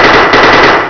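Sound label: machine gun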